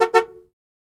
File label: Jeep Cherokee Horn Honks, 2 Very Short